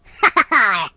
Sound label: Laugh, Pirate voice; hedgewars